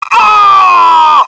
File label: death; svencoop; scshogo; fallentrooper